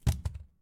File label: Heavy cam drop; Phasmophobia